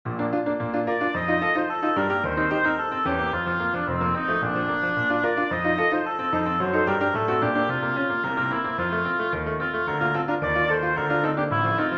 allegretto con moto for oboe piano; mendelssohn bartholdy felix; from lieder ohne worte

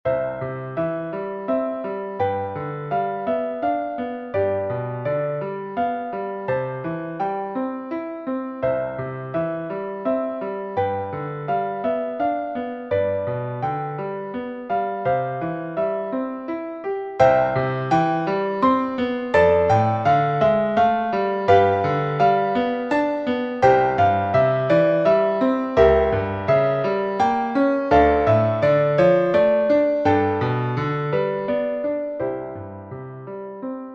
polish lullaby

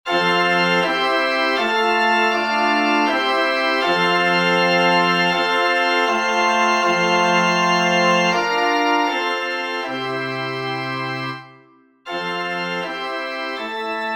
easy organ

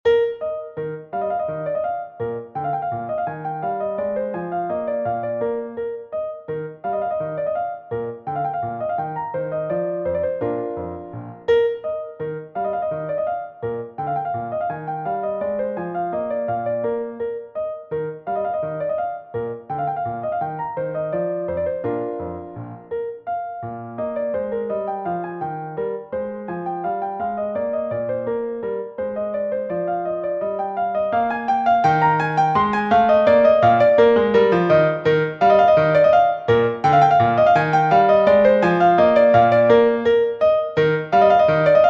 allegro moderato; hook james

